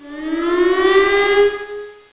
siren

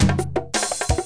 machine; SGI O2 Out of Box Experience; jungle Island; Cyber Gourds

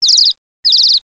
sparrow; My Animated Word Book; zoo

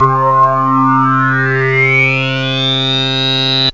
phazed t wave

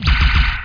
fan fire; thexder

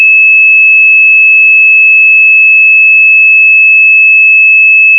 Blue Box Sound

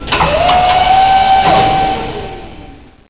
reload; RETURN FIRE

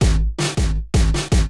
DRUMLOOP D+B 160, Drum+Bass Loop; eJay

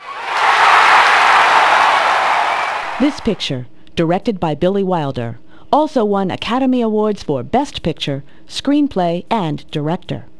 CHEER; CompuServe Multimedia Workshop February-March 1995; quiz

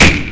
gun

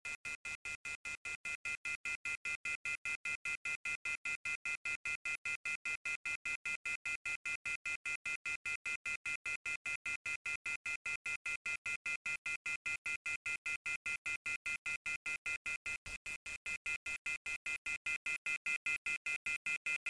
hang up

